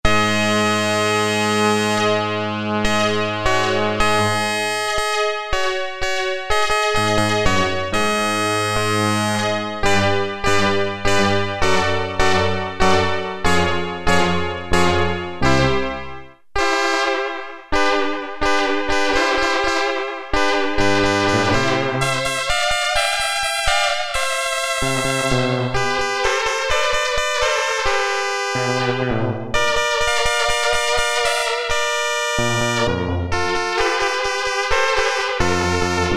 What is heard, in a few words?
Release-Repeat, Prophet-5; Sequential Circuits Prophet-5 (Ver.3); Factory Patch